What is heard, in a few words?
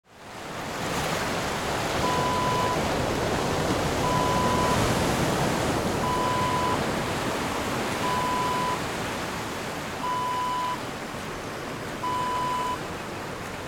ocean waves